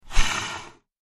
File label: Slow Trackball Spins, mouse